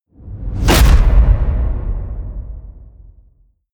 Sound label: wham, whooshes